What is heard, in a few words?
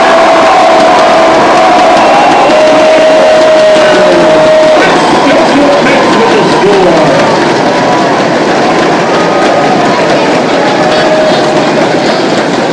football action; ubc; go birds